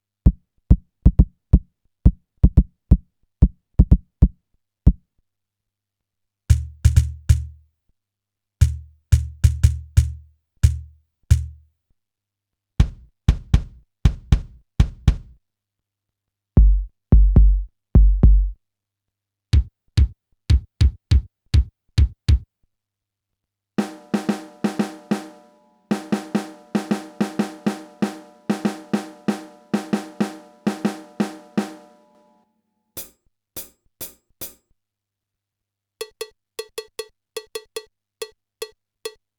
Laser Stomp Pro; Drumport

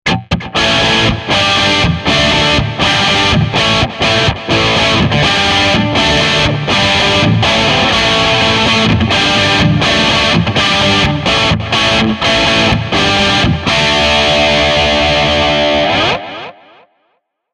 Full Stack

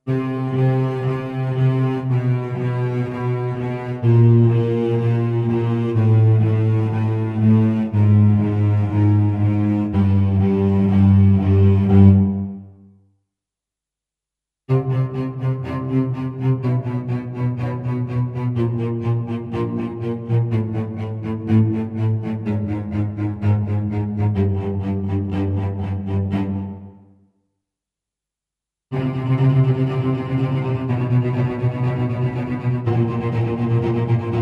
Rhythms 4 8 16

